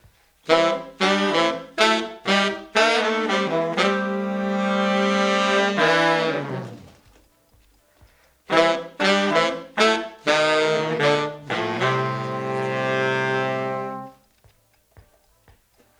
Horns, 70s Analog Funk; Sonic Foundry (Sony Creative Software); What it Is!; Classic Caddy

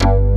PLUCK BASS, JV 1080; Pro-Rec - Synthsonic

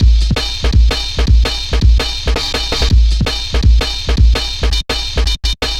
Klanga, drum loops 165.5; Jungle; Zero-G Jungle Warfare 1, 2 & 3; 90 s Sample CDs

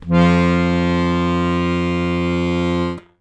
ACCORD BASS F 1

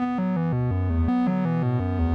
ETHEREAL TEXTURES; Sonic Foundry (Sony Creative Software) - Future Retro Dance Excursions

